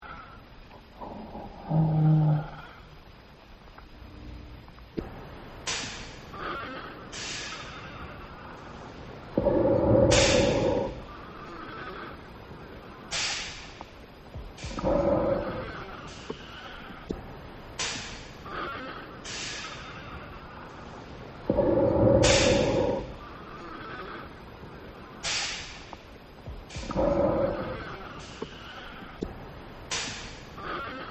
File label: ocean